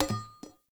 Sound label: Hunter Bow Drop Arrow; Weapons; Enemy; Bows